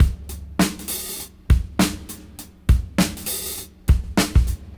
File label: Swing 99 BPM Rev; AMG; Keith LeBlanc Phat Butt Jams